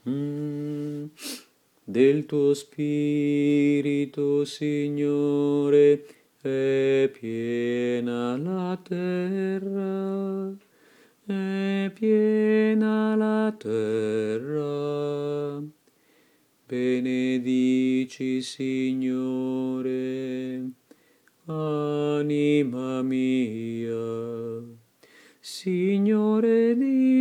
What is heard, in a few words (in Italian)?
del tuo spirito bassi